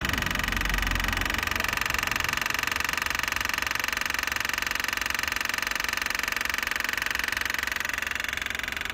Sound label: Rapid Clicking Sound in Car